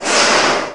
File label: air zero; R110A